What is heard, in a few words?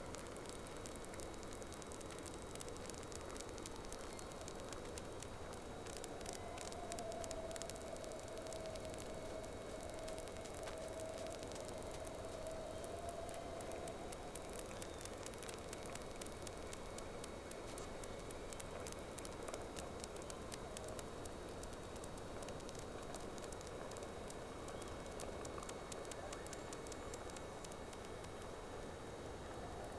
Whales; SeaSnake